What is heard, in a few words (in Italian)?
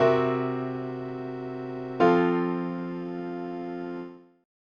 Settima diminuita